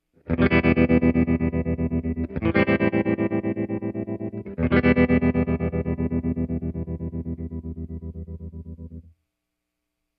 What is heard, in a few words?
first 4 rhythms, tremolo; empress-effects